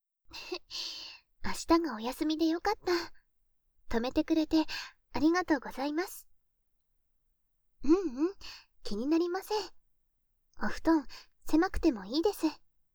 癒しの添い寝と子守唄, ASMR; 効果音あり

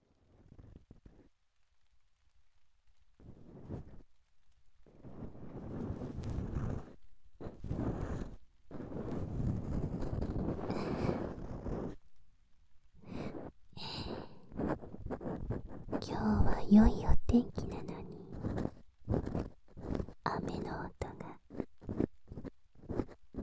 耳かき, ASMR; 愛しい妻の耳かき

